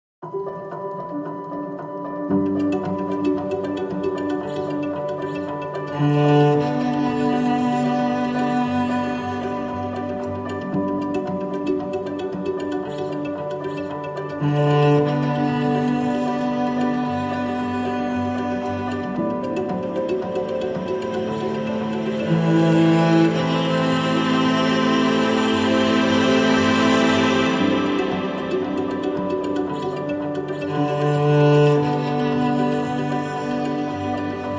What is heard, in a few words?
No Glockenspiel